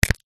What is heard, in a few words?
human body joint click crack